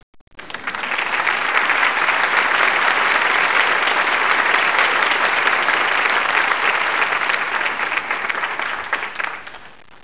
crowd

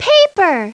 Paper; Treasure Galaxy; Learning Company

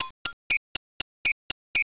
Drum Song; Mythos

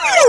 stretch; sfx; Universo Animado

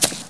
step, footstep grass; SINDEMO

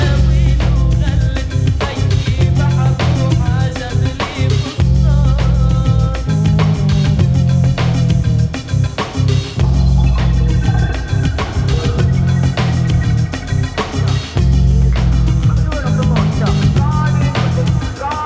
loop; Digital Culture Stream - Volume 4A; orb; Outlands

